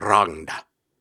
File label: Syllables and Imaginary Words, Viking Vocal, Hard; Viking Vocal Builder